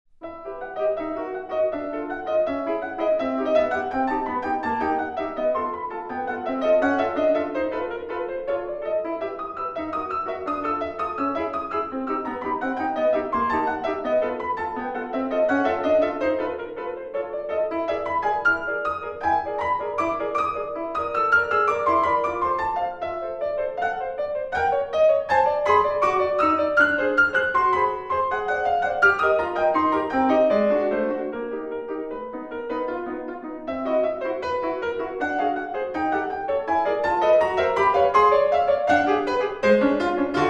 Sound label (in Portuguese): Piano Ponteio; Encontros com a Musica de Sao Paulo